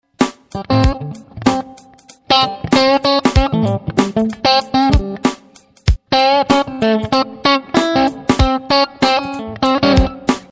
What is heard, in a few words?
Funky blues; terz